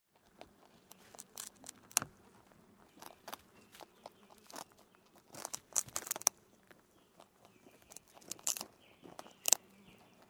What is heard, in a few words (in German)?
Kaninchen; Bauernhof; erlebnis bauernhof